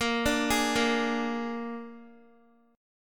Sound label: Bb 7th Bb down Guitar 4E,4A,5D,5G,5C,6F; Bb7 arpeggiated